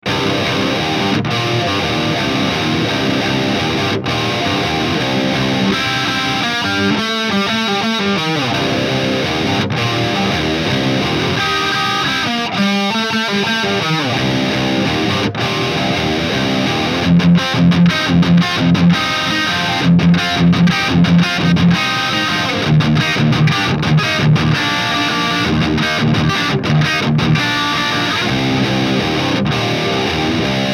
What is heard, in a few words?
Peavey 6505 Lead